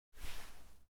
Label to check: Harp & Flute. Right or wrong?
Wrong